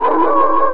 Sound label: beast; Undercroft